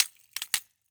Rollerskates, old fashioned, all metal, wooden wheels, clash, strike, clatter, ping, double, loose; Eiravaein Works; Vaeyan IV